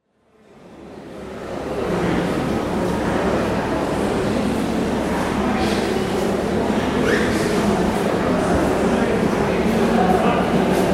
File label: Crowd Hall Art Gallery Ambience